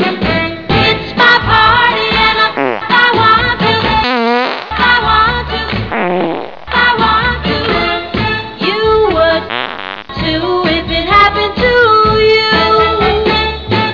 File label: farty; "It's My Farty"